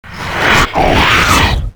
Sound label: zombie idle; csco; csgo; zbm3